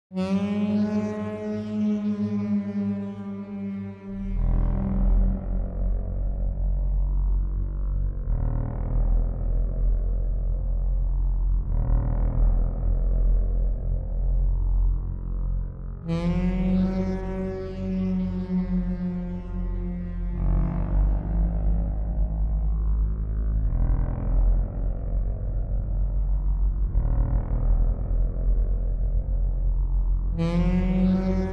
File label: eerie alarms